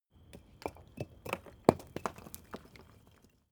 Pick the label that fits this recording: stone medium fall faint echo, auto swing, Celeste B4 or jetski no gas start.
stone medium fall faint echo